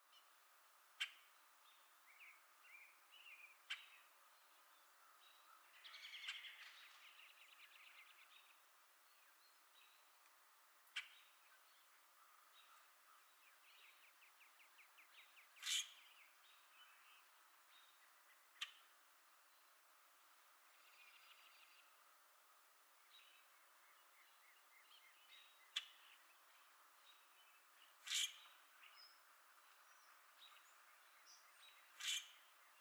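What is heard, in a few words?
Common Grackle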